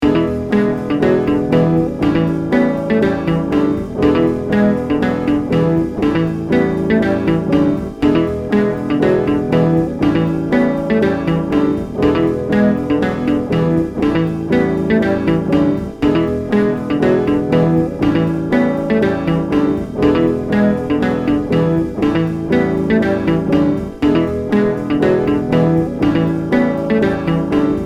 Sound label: Motown